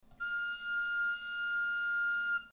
fa, galoubet; Note Fa - 1er interligne; Gal ut

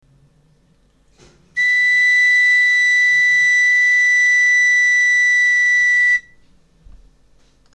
440 do, galoubet; Note Do - 3ème interligne

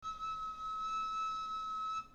fa, galoubet; Note Fa - 1er interligne; Gal Si St Barnabé

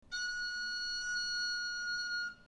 sol, galoubet; Note Sol - 2ème ligne

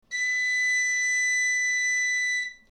ré, galoubet; Note Ré - 4ème ligne

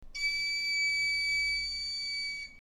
mib, galoubet; Note Mib - 4ème interligne

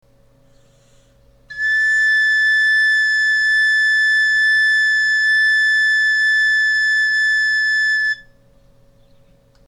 sib, galoubet; Note Sib - 3ème ligne; Gal Si St Barnabé

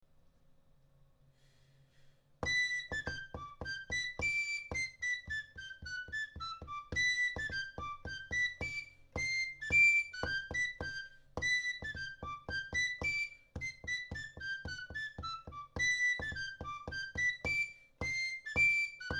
galoubet tutti; Lou Galoi; Farandole de J.-N.CLAMON

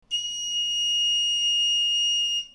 sol, galoubet; Note Sol - au-dessus de la 5éme ligne

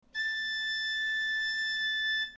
la, galoubet; Note La - 2ème interligne; Gal ut

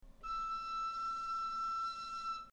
fa, galoubet; Note Fa - 1er interligne

